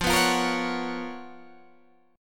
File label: C sharp 13th F